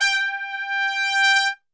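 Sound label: G 3 trumpet; Best Service; Brass Super Section; TRP SWL; 90 s Sample CDs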